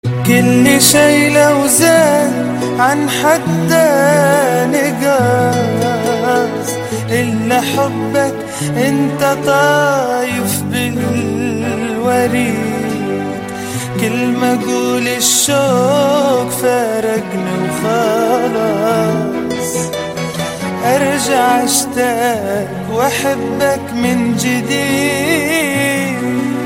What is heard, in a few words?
arabic ringtone